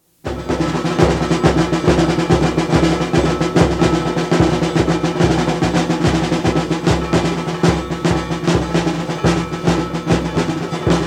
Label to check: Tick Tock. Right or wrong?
Wrong